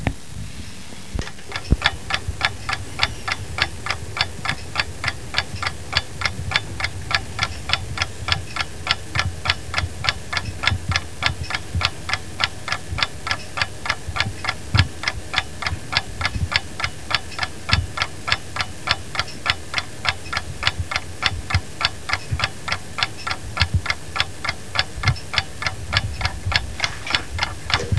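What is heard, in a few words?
ALARM CLOCK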